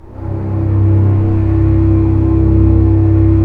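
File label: violins, piano, orchestra; Roland LCDP13; STR Orch. p Slow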